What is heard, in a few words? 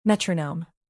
metronome